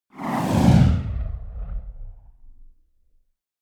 Whoosh Big Air; Hollywood Edge; sound designer's toolkit v2

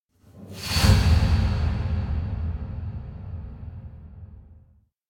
Impact Distant Deep Distant Blow Up; Hollywood Edge; sound designer's toolkit v2

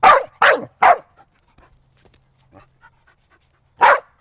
Dog Barking; Animals; 1001 Sound Effects

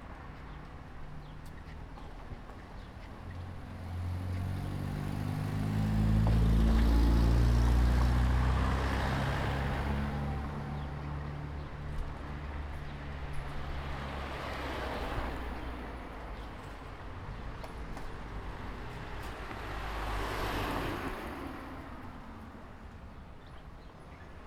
traffic; ambience; NS Studios - ultra sound collection

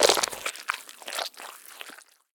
zombie bite; DeadFright

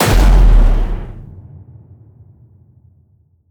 HIT Slam, Cinematic Hits Designed

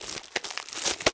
Wrapper Open, Kitchen; 1001 Sound Effects

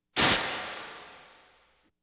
Science Fiction effects, Lasers, Light beams

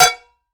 Metal Lid; 1001 Sound Effects

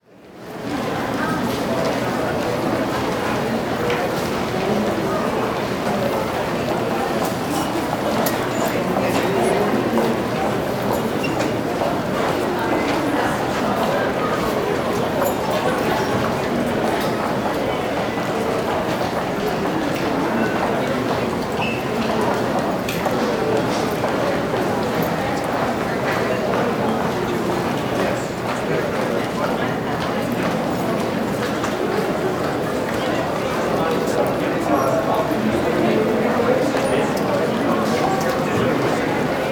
Crowd Indoor Building Concourse, Walla; Sound Ideas; Walla Series

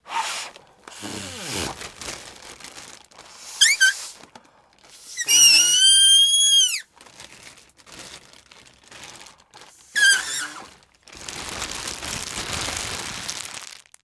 plastic bag blow; best service Blue Box; mixed sounds long

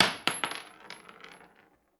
Bullet Shell; Sound Ideas; Guns

